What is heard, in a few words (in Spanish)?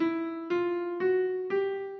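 escala cromática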